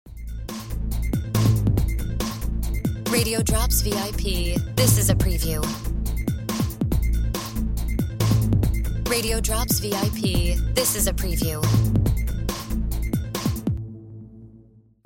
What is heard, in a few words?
No FX Music Bed